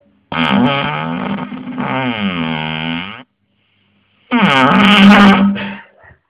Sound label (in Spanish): Pedo